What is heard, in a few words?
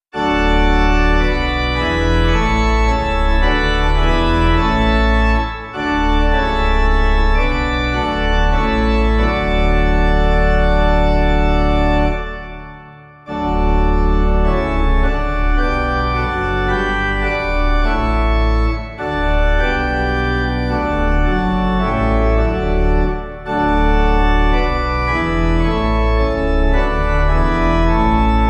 Hymn organ london new; Hymnal 1982